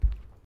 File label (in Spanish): Paso descalzo alfombra; Yesterday Origins